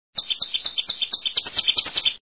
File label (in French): Oiseau